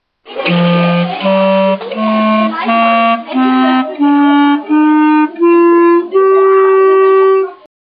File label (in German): Klarinette